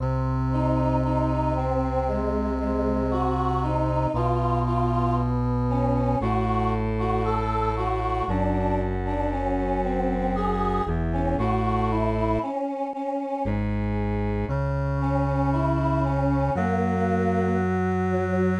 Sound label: MIDI